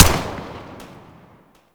Viper Fire, Weapons; Warframe; Vipers

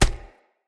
Unarmed Punch Impact Flesh; Weapon Impacts; Player; Combat

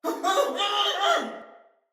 Death Fire, Soldier Male; Battle; Barks; Dialog; Sinecure Soldier Male B

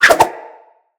Dagger Spin Whoosh; Weapons; Dual Daggers

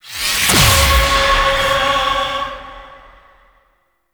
Choir Chorus Cast Life

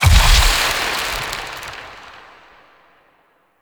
Shadow Particle Death; Warframe; Duviri

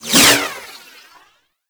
Parazon Whoosh; Warframe; Kuva Lich; Finishers

